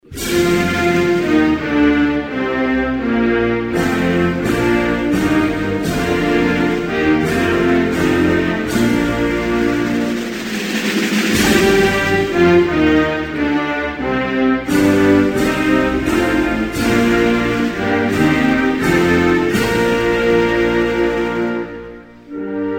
Norvege, Hymnes nationaux

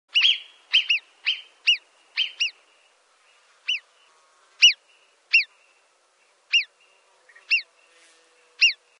marmotte

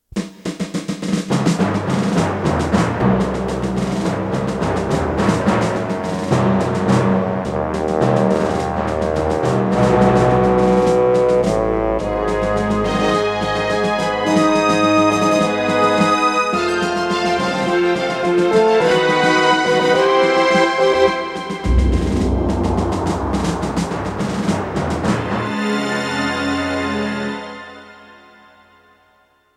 Cinema Jingle; Jingles medley

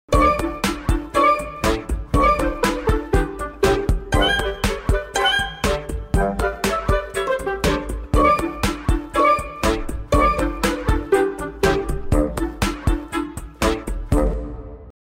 Enfant histoire jingle; Jingles medley

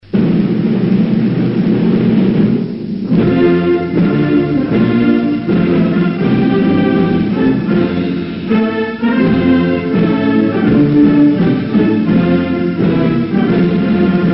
Egypte, Hymnes nationaux

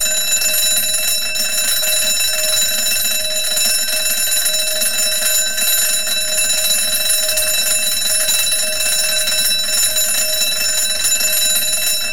Alarme a cloche

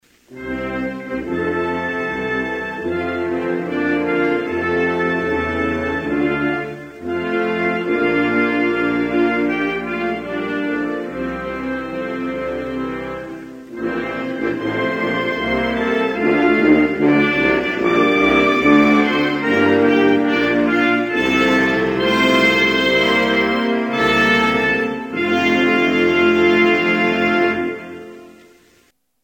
Ouganda, Hymnes nationaux

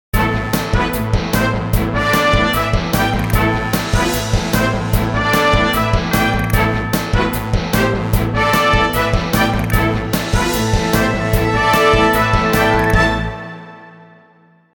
Jingle

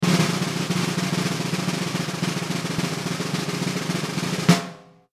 Roulement tambour; Cirque

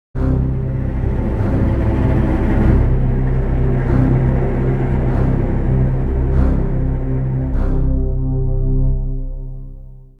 Catastrophe link; Jingles medley

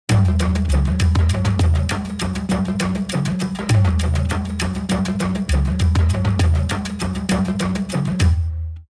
Afrique tribale Liaison

